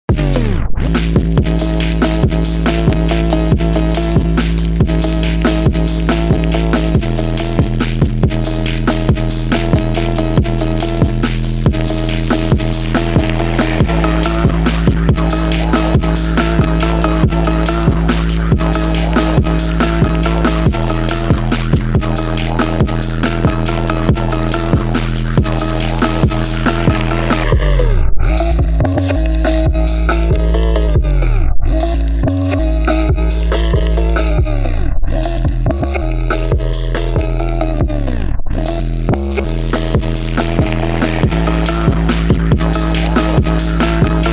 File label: demon phonk